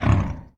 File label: Old Duke Huff